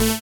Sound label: SYN, dance; Sound & Vision - Gigapack II